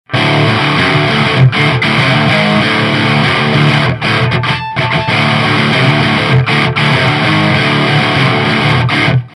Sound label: Hex 50 Savage Mode Dbl tracked; Dan Hopler